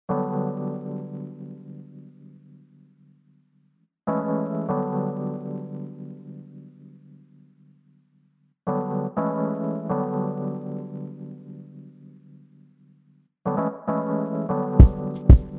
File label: Acid Jazz